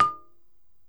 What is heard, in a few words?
BALAFON D; E-MU Producer Series Vol. 7 – Old World Instruments